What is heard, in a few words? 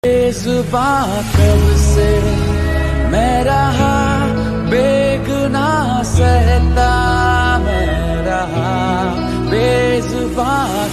ringtone